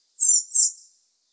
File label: Birds Various, Birds